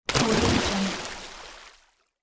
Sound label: cannonball splash; aetherfx